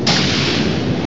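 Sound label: door close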